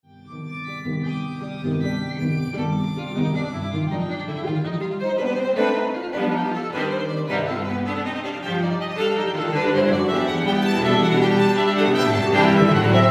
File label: String Quartet excerpt 4th mvt